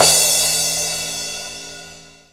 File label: TICTALK DRUM